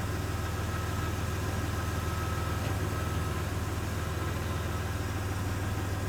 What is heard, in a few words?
vehicle van idle; teardown ps5 windows xbox series xs gamerip 2022